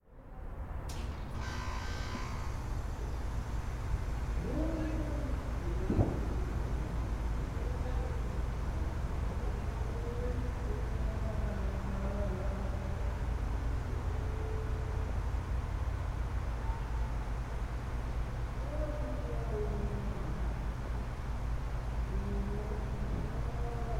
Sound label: New Year's ambience from Greensboro NC; recorded with mismatched microphones in mid side